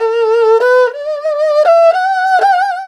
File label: ERHU LICKS